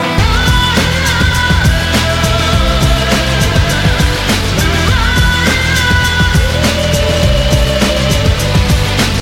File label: win, music